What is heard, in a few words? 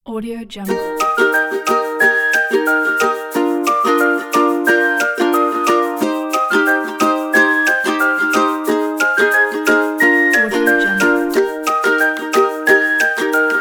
children happy music; grandsoundstock